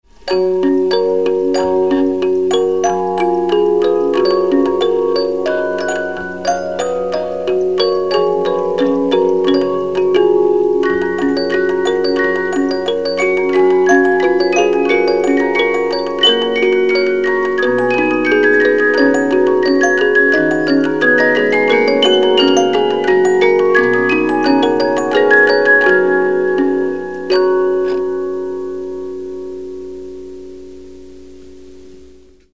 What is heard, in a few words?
Romance, 72-Note Movements, music box; Eine Kleine Nachtmusik